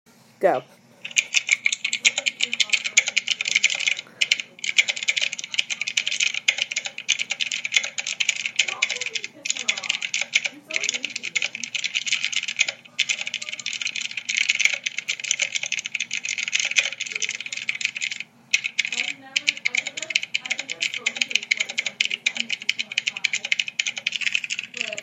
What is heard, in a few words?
Typing; Location: My boyfriends house in Whitestone, NY (Queens); Sounds: my boyfriend typing on his keyboard, me saying “go”